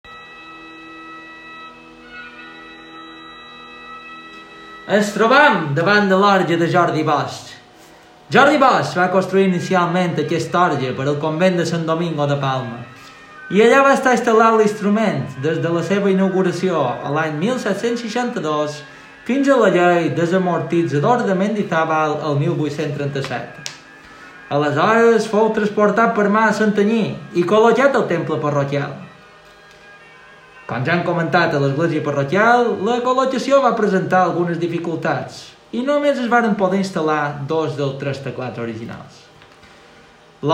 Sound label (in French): Orgue